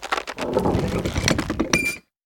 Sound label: Lowered To Raised